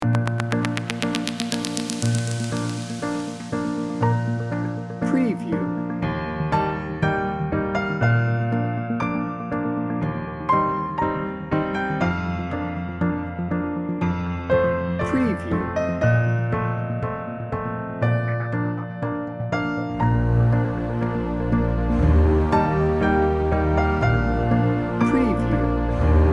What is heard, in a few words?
hopeful and heartwarming